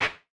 CLAP, Vermona Mono15 Drums

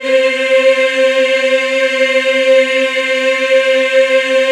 C4, Choir Acoustic & Synth; USB Soundscan vol.28; AKAI

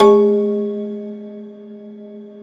Rubbery Piano, prepared piano; Total Piano

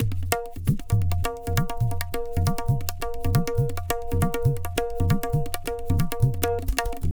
Tabla, 135 BPM; Zero-G; Pure Tabla

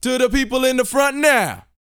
VOX F, Vocal Planet R&B & Dance HIP-HOP; ILIO

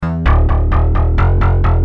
Bass, Industrial

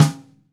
Radio King Snare mF Room; 1942 Radio King Snare One-Shots; Sonic Foundry (Sony Creative Software); Vital Drums

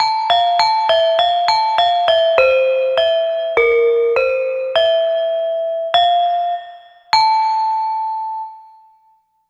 Pelog Unison Demung; Melodic; Ambient-Melodics-II

